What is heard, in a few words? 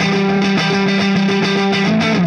Clinical Distorted Funk Guitar